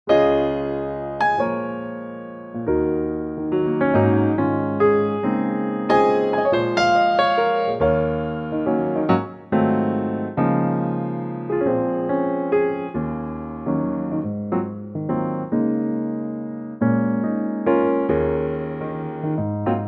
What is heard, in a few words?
piano Db; key - Db - vocal range - Bb to Db; Wonderful Italian gem that was used in the movie "The Flight Of The Phoenix"